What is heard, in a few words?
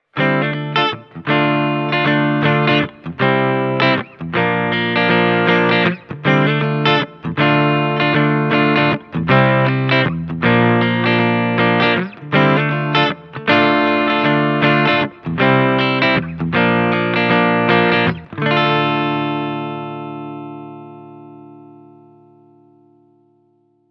blackstar ht metal 5 clean direct